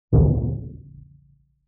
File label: Timpani Hit; Vintage Cartoon; Drums & Percussion